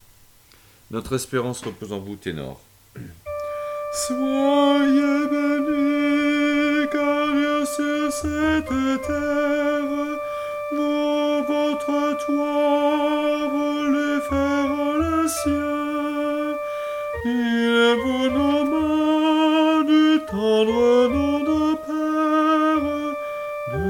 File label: tenor; Notre esperance